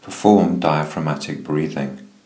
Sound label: perform breathing